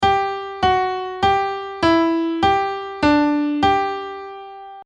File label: Playing in G; piano quiz